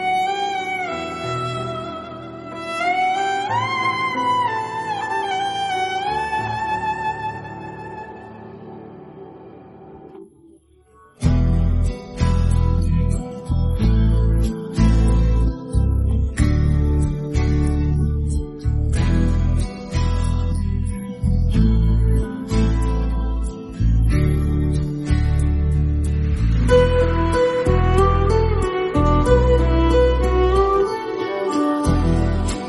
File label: Violin - Bgm